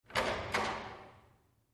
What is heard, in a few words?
Metal Door Push Bar O, push bar